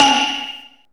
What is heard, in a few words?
SE GAME EXTAP; maimai